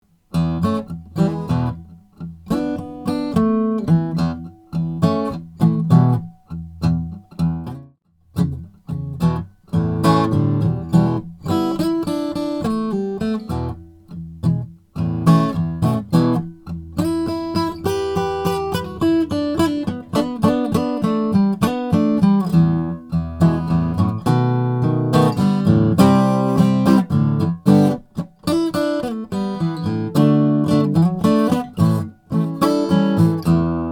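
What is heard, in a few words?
froggy bottom h 12 mahogany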